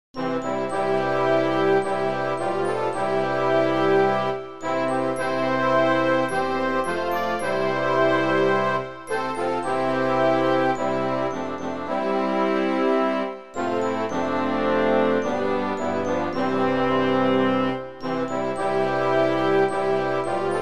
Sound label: schoolsong instrumental